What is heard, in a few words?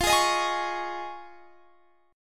D sharp 7th Suspended 2nd Suspended 4th F, Guitar